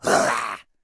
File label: troll male main attack